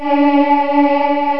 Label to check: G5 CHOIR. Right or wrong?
Right